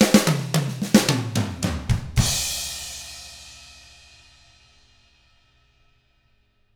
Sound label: POP ROCK, Drums; ILIO; Double Platinum Drums 1